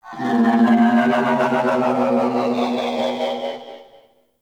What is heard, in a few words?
Ship Exterior Creature Calls; Warframe; Corpus Ship Remaster